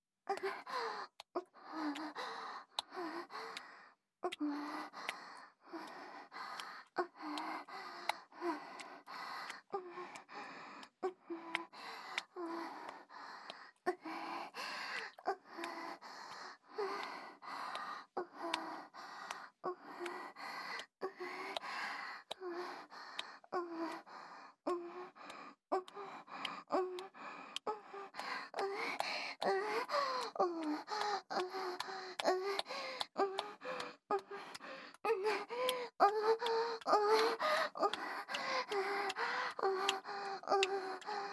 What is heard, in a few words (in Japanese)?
挿入 喘ぎ; SEあり